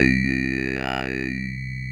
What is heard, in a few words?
SYN, Drum & Bass, 175 BPM; Ueberschall; Drum & Bass Flash Trackz